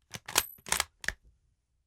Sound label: k98 fast; K98 bolt action fast speed; Ejecting empty case and reloading next round; Vigesaa Productions